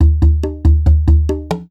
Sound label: PERCLOOP TEKNO 140; Techno2